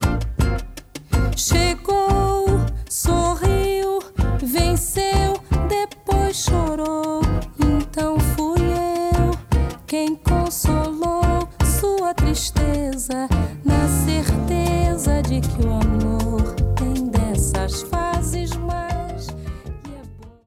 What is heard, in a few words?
brigas melody